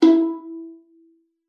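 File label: violin pizzicato E4; Xsample VOL-5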